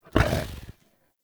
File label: Wolf Jump Heavy, Vocals; Animals; Actions